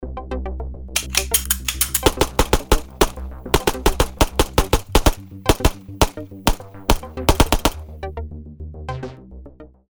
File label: Ambisonic Handguns Ruger P89 9mm